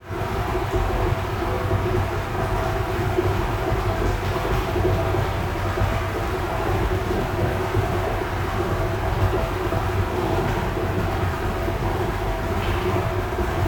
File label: Big Water Tank Filling; Tools and machines